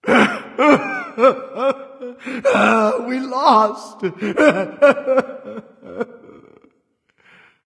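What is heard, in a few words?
nonverbal boohoo; smite; voicepack; ullr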